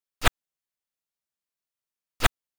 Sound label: Shuriken Sound; Oretachi Game Center Zoku: Yie Ar Kung-Fu